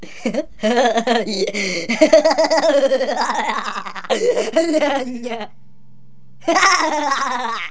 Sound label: laugh